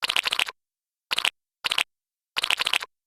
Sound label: tuna rumble; olivia